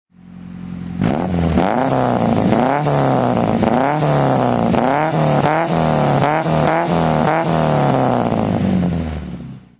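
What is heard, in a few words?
saab 96 v4 throttle, rally car; Saab 96 V4 rally car, 1967; Engine sound; V3D/ ClassicarImages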